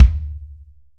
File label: smooth bottom end kick drum sample d key